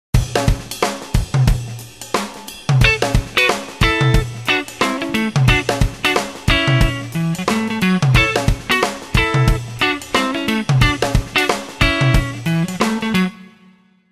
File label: Rhythm Guitar